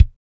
kick, lite jazz, Roland; Northstar; Drumscapes Roland; AC Lite Jazz; Kicks

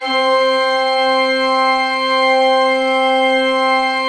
C3, Cathedral Organ; ILIO; Propeller Islands Cathedral Organ

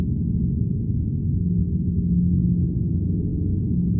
sparse soundscape samples; ReLooped

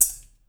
bowl, metals; Spectrasonics; Liquid Grooves 1 & 2

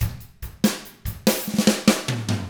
ROCK 6-8, Drums; ILIO; Double Platinum Drums 1

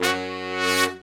BRS SHRT, R&R Horns, Sect; Roland L-CDX-03

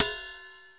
CRACKED TRI; Dance Set 1

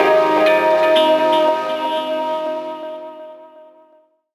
PAD GTR FX, Gbm; Rawcutz - Nu Forms

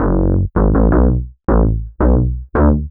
Piki Bass; Sonic Foundry (Sony Creative Software); Knutrix Drum & Bass

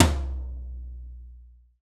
TOM TOM, Real Kit

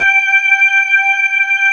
B3, Keyboards Old School; USB Soundscan vol.09; AKAI

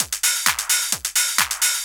drum 130 hihat, Techno Trance, Drumloops

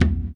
surdo; ILIO; Ethno Techno

